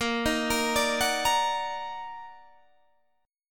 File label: D sharp Minor Major 7th A sharp